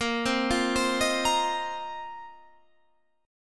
A sharp Suspended 2nd Suspended 4th, Guitar Standard; arpeggiated; A#sus2sus4; JGuitar